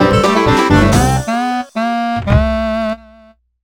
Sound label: Failure Sting; nds